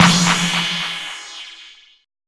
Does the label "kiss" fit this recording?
No